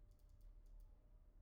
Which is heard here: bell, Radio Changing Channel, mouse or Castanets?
mouse